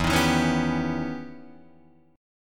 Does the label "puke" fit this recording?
No